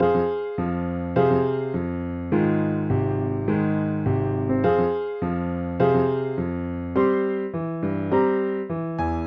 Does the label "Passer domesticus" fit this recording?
No